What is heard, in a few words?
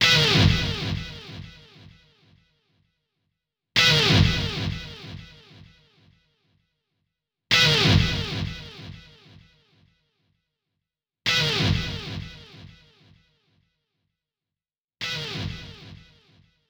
Headshot Delay; Orcs Must Die